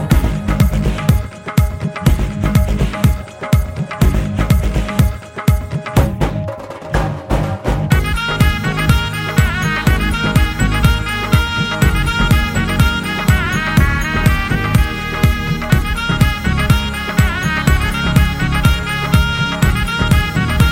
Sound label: Drum Beats